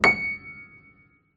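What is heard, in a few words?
piano c#7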